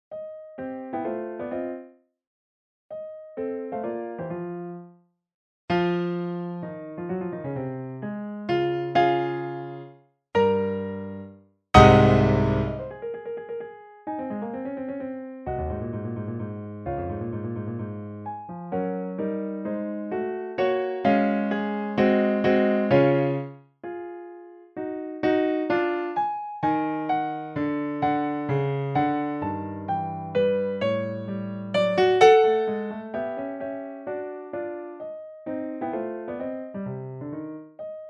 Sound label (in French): Mouvement Pour Piano; Ludwig van Beethoven